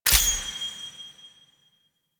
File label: UI Mod Install; Tennokai; DE; Warframe; Mods